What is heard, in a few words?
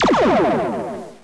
photon, weapons